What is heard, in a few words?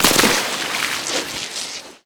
sewer slide friend; Call of Duty Modern Warfare